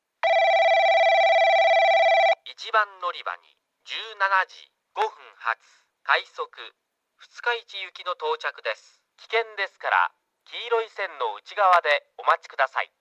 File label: balloon kiyama rapid futsukaichi; 1番のりば接近放送 男声; 基山; 快速 二日市行き; バルーンフェスタ号; JACROS簡易詳細型; TOA ラッパ型